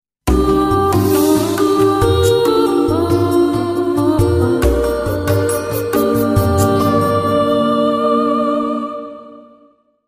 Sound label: timeless jingle